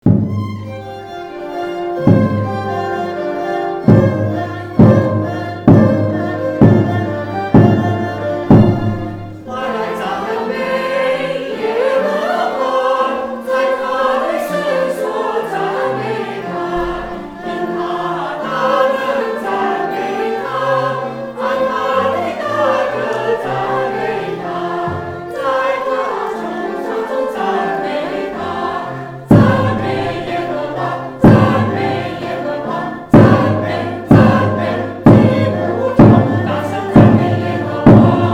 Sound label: praise the lord, tune of drums of fongyang, choir; anthems; nocbc